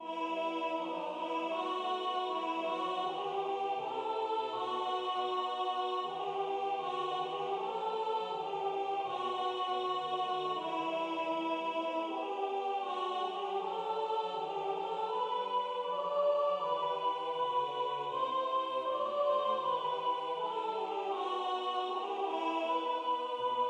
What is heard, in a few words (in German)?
Sopran; Anneli, wo bisch gester gsi